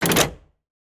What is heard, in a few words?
Floor Switch; Idle Champions of the Forgotten Realms